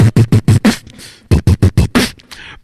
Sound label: mostly bass kicks, Beatbox, Drum loops